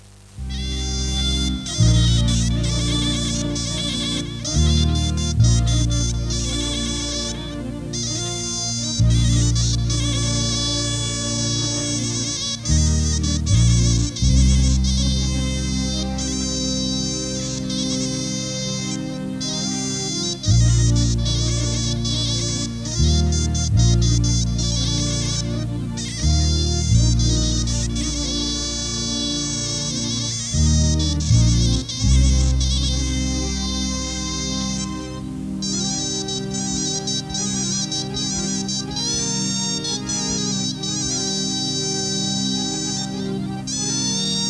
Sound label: zurna